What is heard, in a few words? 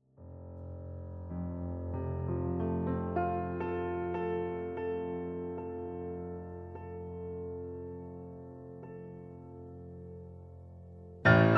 Piano; Chopin Ballade F Dur